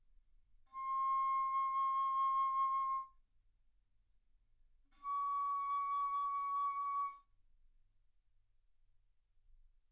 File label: Bass Flute C6 Db6; ff